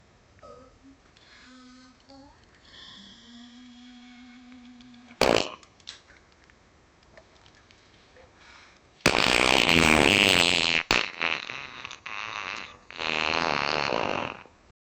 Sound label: a man eats cheese sauce, enjoyment